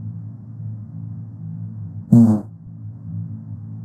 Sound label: Farting in a mug